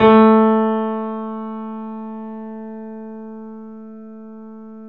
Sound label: A, The Piano; InVision Interactive